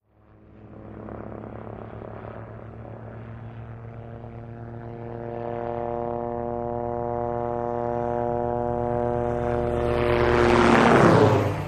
OH6 Loach By Fast Circ; little bird

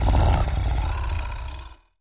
CREATURE GROWL

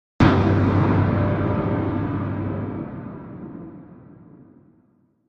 Impact Metallic Thud

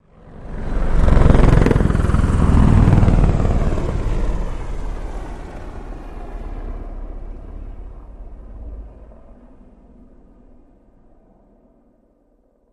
Apache Low Med By Righ, AH-64 Apache

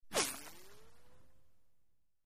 Tonal Bullet Whizzes, bullet bys

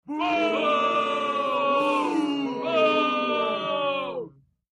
Boos Small Crowd Booing

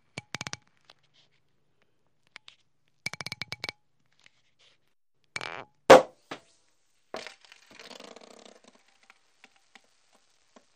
Slow Pull Out Of Cork From Bottle With Pop, Fizz; Slow Pull Out Of Cork From Bottle With Pop, Fizz And Drips

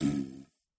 Bowl Fart Soft Dry

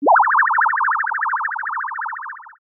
Multiple ray gun blasts. Zap, Ray Gun

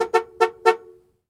Jeep Chrke Horn Honk; jeep cherokee 84

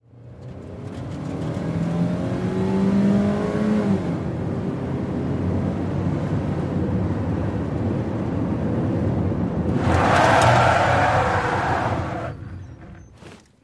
Sm Car Accl Skid, compact

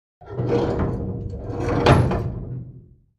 Antique Industrial Dryer Drawer Close

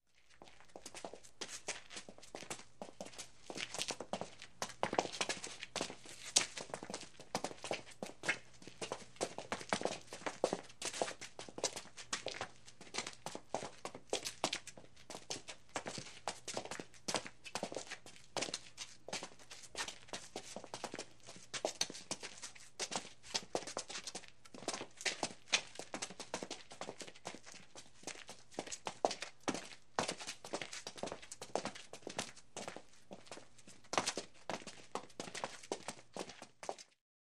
Footsteps Group Wom

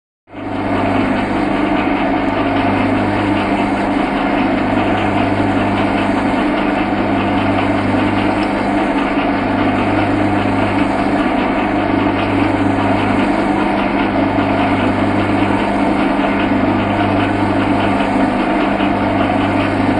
Conveyor Running Con, conveyor belt

